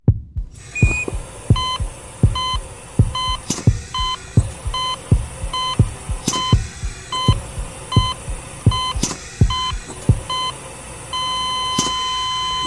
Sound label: Heart break